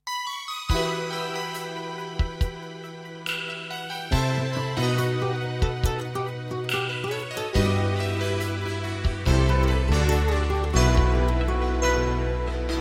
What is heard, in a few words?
MARRIS elektro-acoustic B100M; demo song